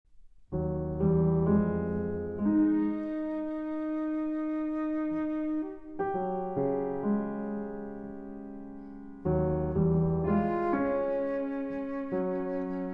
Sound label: Miniatures for Flute